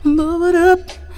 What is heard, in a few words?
FEMALE VOX, Hip Hop